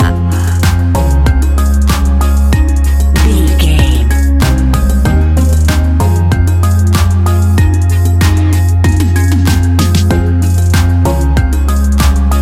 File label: ibiza islands bliss beats, A fast paced piece of club music; A fast paced piece of club music perfect for the nightclub, and a youth orientated visual scene.